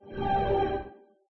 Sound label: game in zone; There; ThereClient; gamekit; gunkit